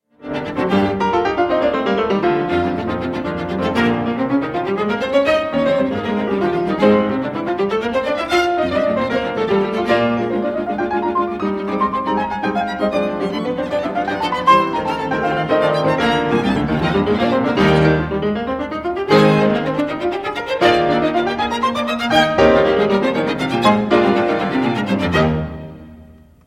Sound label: string quartet, quintet for piano and str; robert schumann; quintet for piano and strings op 44